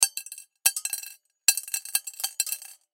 Screw Drop Multiple Several Metal; Hardware; Industry; Machines; Blastwave

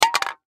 Aerosol Can Drop Knock Over On Counter; Drops (Sound Effects:Objects); Foley; Blastwave

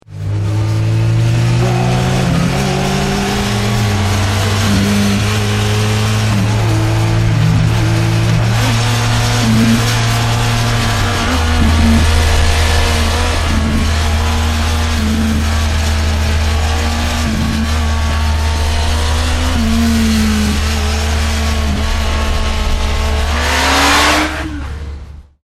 Motorbike Honda 1100 On Board Travel; Tom Hutchings

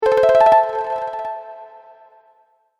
Games Win Sound, video game

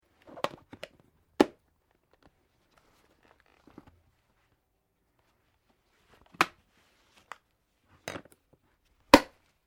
Ski Snow Blades Bindings Boot Lock In; Snow Skiing; Skiing and Snowboarding; Sports

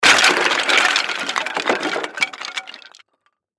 Crash Wood Debris; Small; Sound Effects:Materials; Blastwave

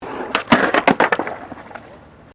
SKATEBOARD SKATE BOWL COME OFF; Skateboarding; Sports

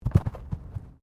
Body movement Tumble Light

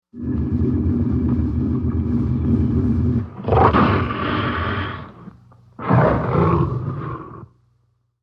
Tiger Growl Hiss; Big Cats; Animals; Big Cat Sound Effects

